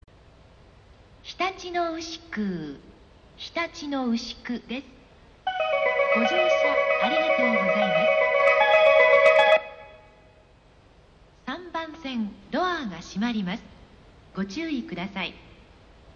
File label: 3 melody, station; hitachinoushiku; joban; jreast